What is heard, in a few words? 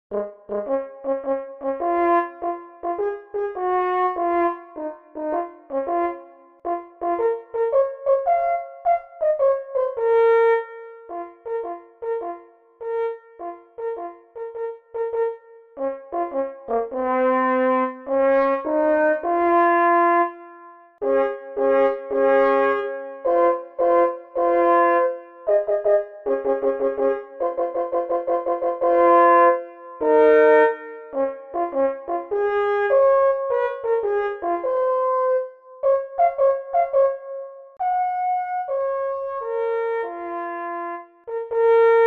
French horn